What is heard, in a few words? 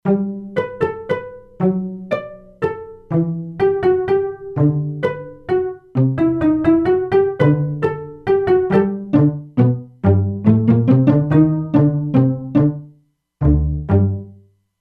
Pizz Celli, E-mu Proteus-2; Factory Presets